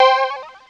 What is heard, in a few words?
BEEP; VOICES